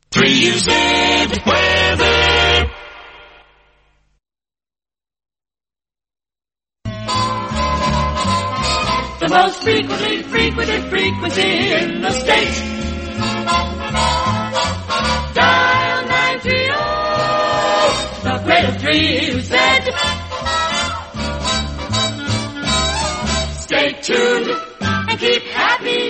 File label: long vocal theme; australian otr promos and idents; the greater 3uz